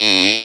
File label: speak and spell E; ALPHABET